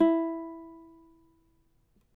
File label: CAVAQUINHO E, Guitars; Spectrasonics; Hans Zimmer Guitars VOL-2